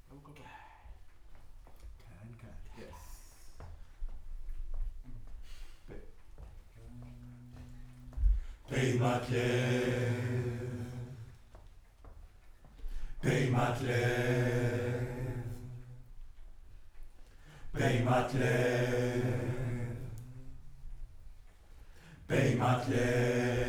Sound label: Choir Far